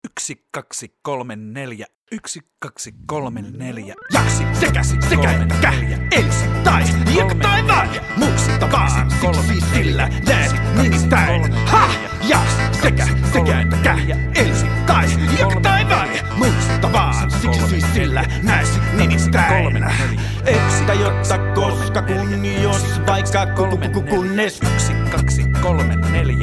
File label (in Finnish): rap ilman rumpuja